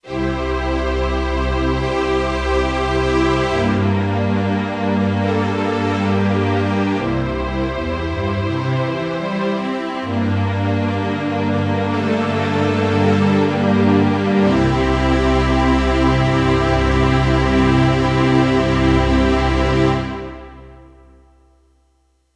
String Section

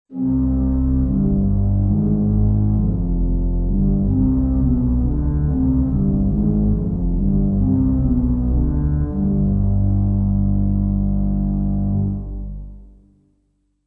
Instruments Keys Organ Pedal Subbass

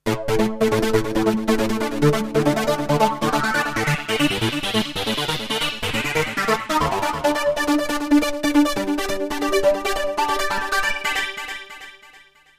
arp alla

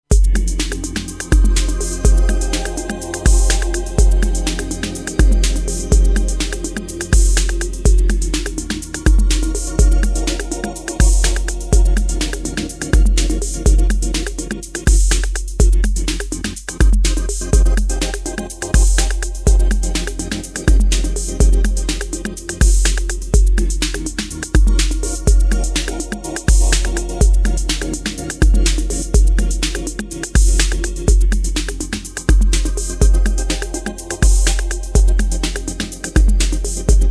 TR8 External Sidechain

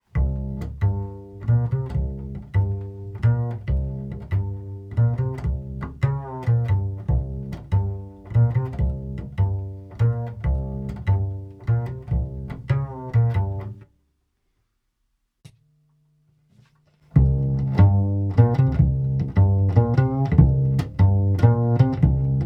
Upright unplugged plugged

